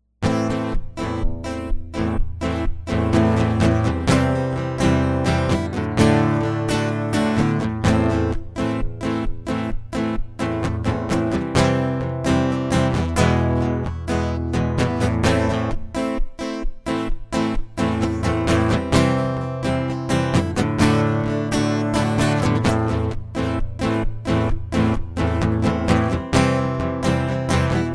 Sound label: acoustic